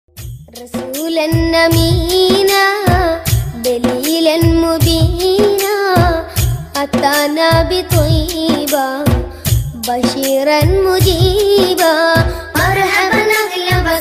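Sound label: arabic ringtone islamic ringtone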